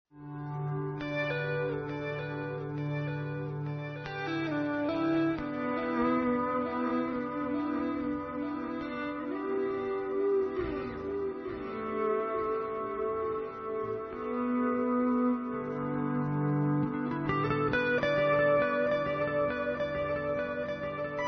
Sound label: trimeister